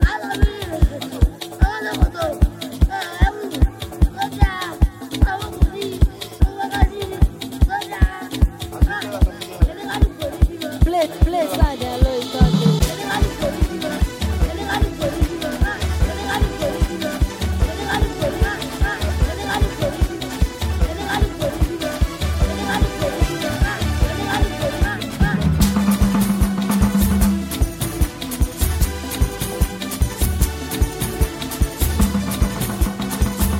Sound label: Cruise Beat